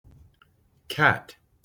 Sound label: cat